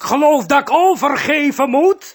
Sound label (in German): Jingels